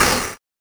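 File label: mod snare, Synths and FX, Mod Drums; neuro; Sinistarr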